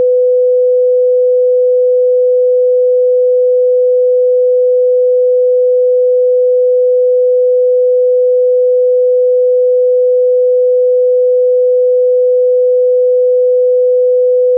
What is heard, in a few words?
tone 500Hz; Applied Acoustics